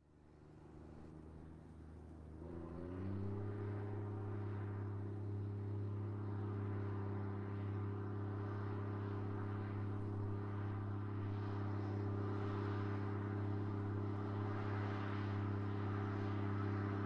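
case puma 180 exterior by steady rpms high distant; sonniss gdc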